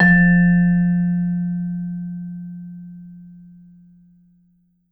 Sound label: VMED F2, vibe med mf; Marimba & Vibes; Sampleheads; Dave Samuels